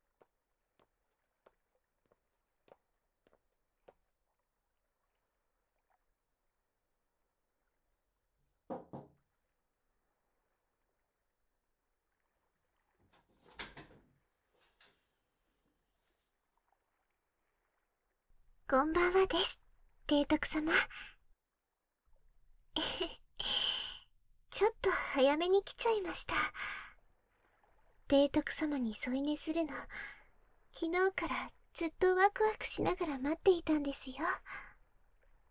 海中の眠り-ウォーターヒーリング

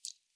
しぶき 短; ぴゅっ 短め

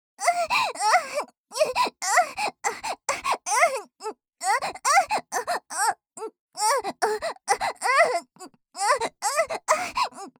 喘ぎ声

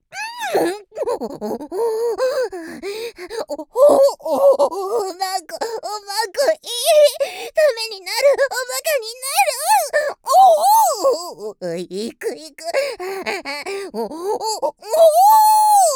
んおぉっ おっ おっ ほぉっ

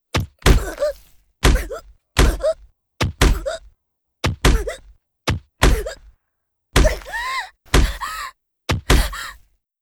腹パン

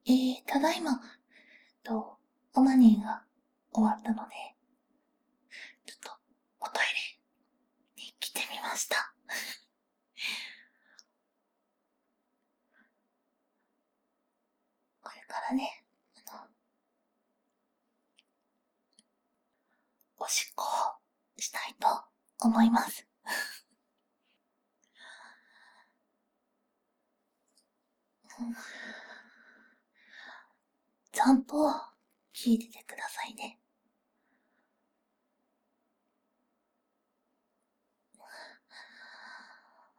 トイレおしっこ ステレオ

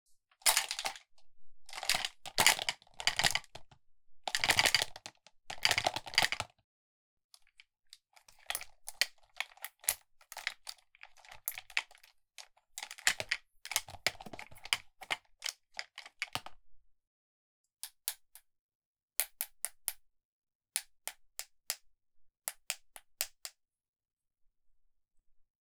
文具遊び 左

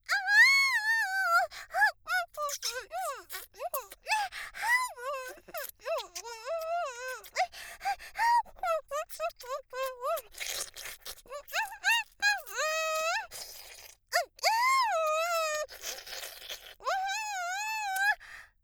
フェラ 絶頂; ありすちゃん（リスみみの地下アイドル＋コンビニバイトAさん）

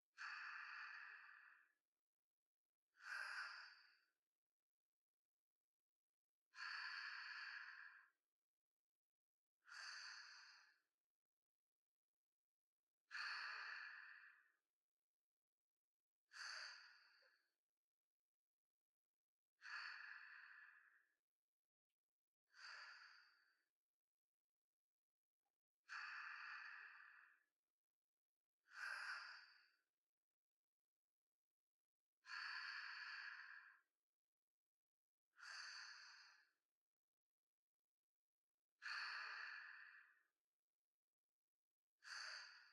寝息, ASMR

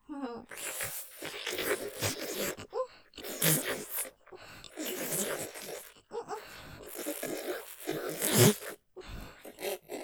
追い込み フェラ音マシマシ 絞り取るようにバキュームフェラ; ステレオ; ループで楽しむ！シェフの気まぐれ抜き抜きコース

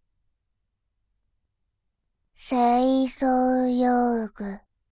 清掃用具, ASMR, バイノーラル右

